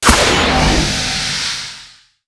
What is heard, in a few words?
Book Heal